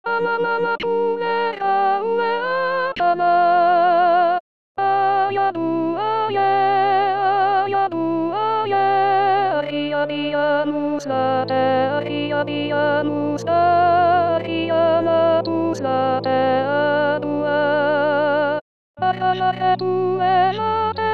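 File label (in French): soprano voix accompagnement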